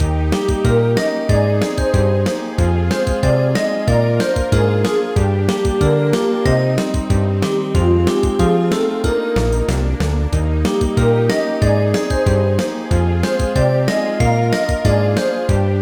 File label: BGM Menu; Gokujou Mecha Mote Iinchou Girls Motekawa Box; NDS